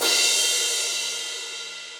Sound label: Crash 14 Big Punchy; Drums; Drum Hits; Standard Hits; Cymbal; Ableton; Factory Packs; Session Drums Club